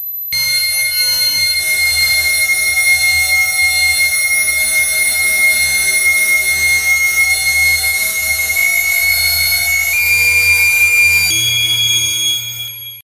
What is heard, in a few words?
tuuuuIIIuuuTuuuIII